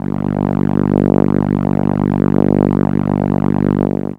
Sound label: Contra G1, ARP Solina, contra bass; musicradar-synth-samples